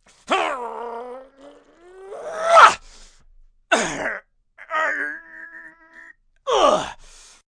Grunt Lifting, Grunts, groans, lifting, strain, male, straining with anger, lifting weights; human voice; Groaning; VoiceBox